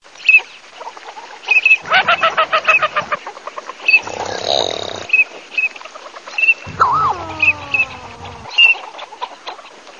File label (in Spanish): PATO MIX